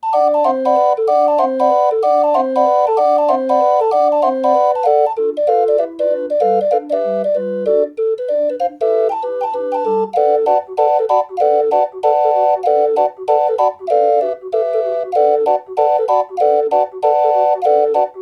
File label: swing, drehorgelmusik